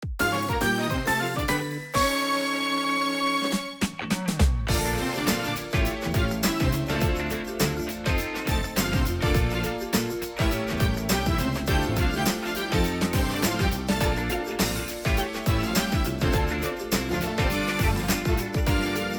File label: inst